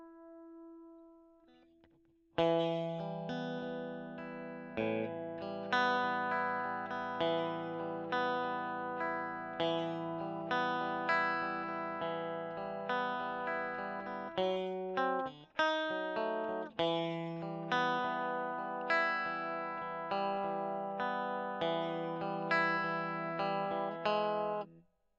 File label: E. GTR Gefell